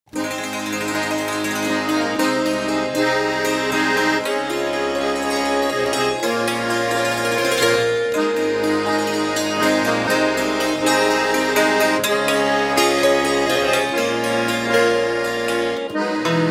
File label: funky